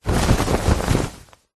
clothing sports shorts shake

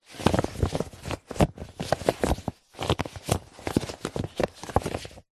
leisure whoopee cushion inflated handling

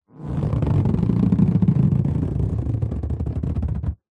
balloon inflated handle creak muffled; foley; zapsplat

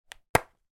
household game case open; nintendo ds

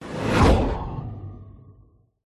whoosh; Audio Mangler

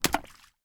impact large flint stone throw in puddle

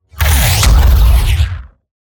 Whooshes Impacts Impact; soundbits

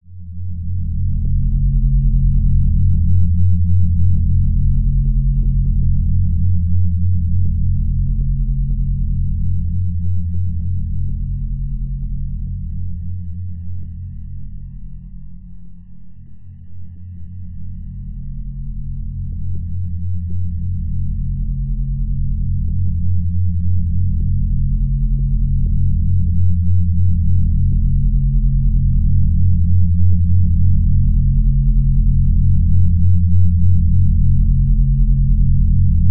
science fiction drone spaceship interior lfe

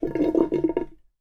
household bathroom sink gurgle

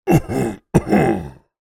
fantasy giant male cough

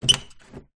household door open internal wood modern; zapsplat

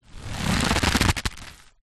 foley rope stress tighten creak; zapsplat

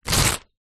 notepad small binded page rip tear out; foley; zapsplat

